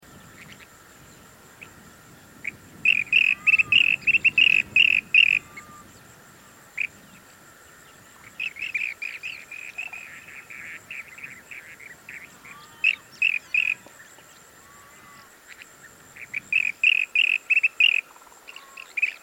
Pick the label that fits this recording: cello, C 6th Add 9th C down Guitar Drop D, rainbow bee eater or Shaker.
rainbow bee eater